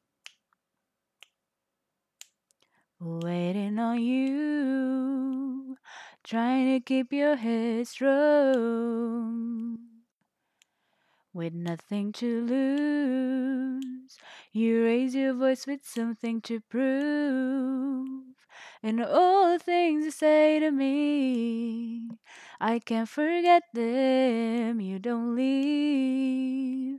singing, cover

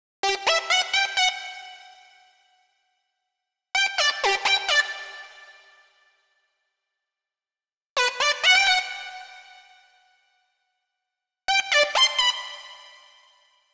Saw Pluck Lead, 128BPM

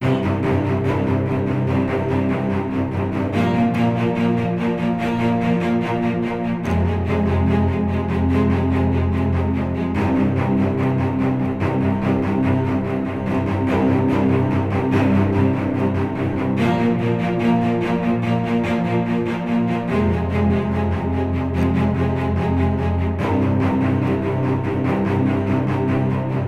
strings, 145BPM

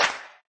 Sex Smack; Lewd SFX

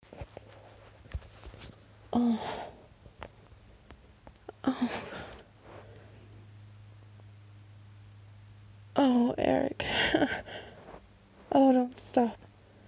Shhh

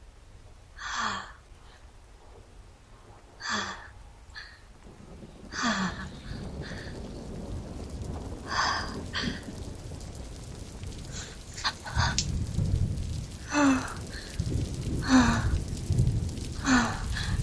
Thunder

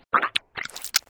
Suck

